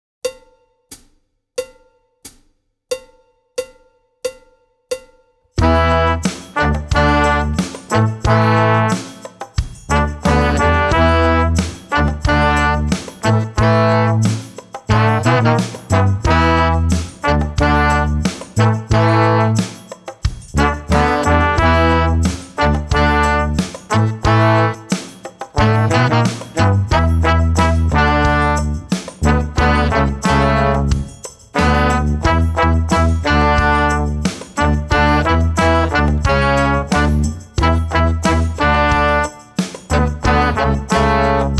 Brass Song

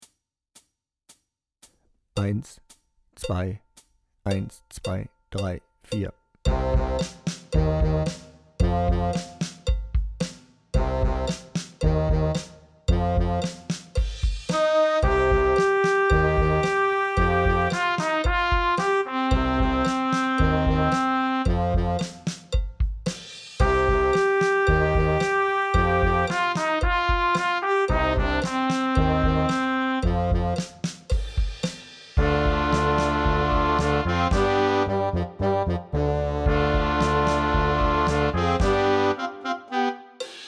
Heavy Metal Playback 112

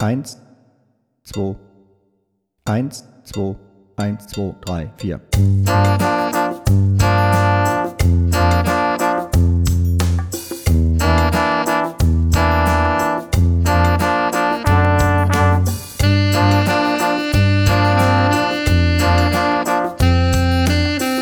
Sax Solo

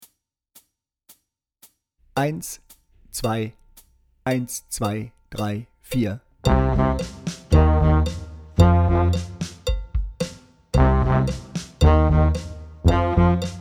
Heavy Metal

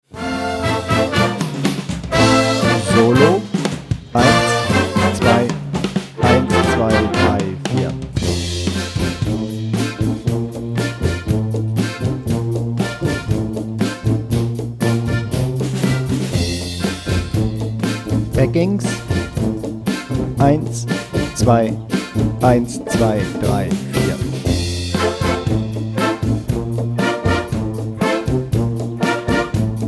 Solo Playback; Chat De Gouttiere

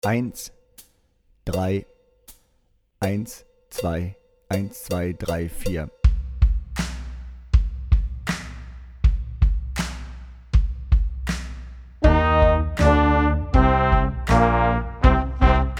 Power Rock

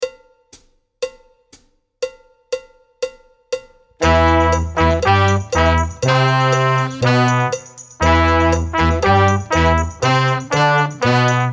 NoDrum

